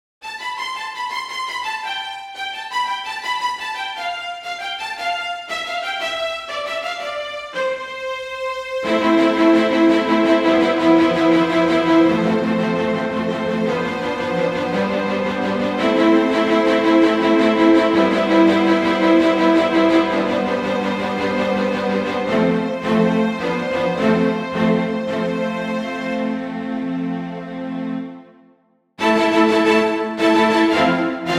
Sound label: generic strings